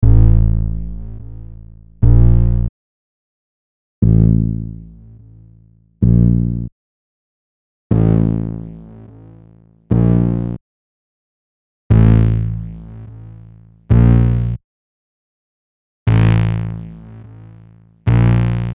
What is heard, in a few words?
Dry Dist EQ